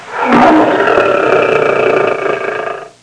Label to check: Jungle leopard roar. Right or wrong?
Right